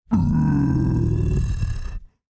ogre death; The Monster Plane; kolmods death

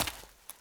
step; demon dogday

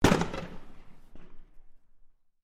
bam; tv god corvus; gbox tv boss

